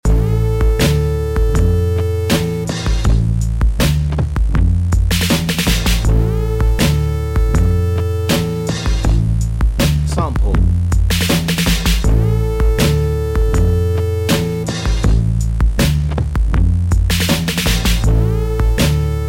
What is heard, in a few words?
down drums